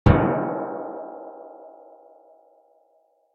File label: cannons dig; minetest